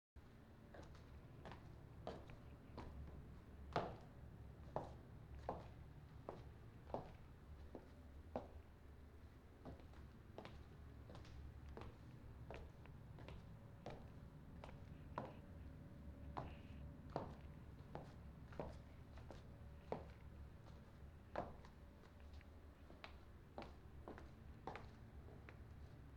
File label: Walking In Heels On Hardwood